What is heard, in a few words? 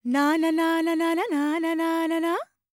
World Voices, INDIA; ILIO; Vocal Planet